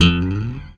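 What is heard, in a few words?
slap, bass; Xsample VOL-1; SLAP+THUMB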